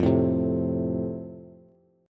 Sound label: F Major, Bass; strummed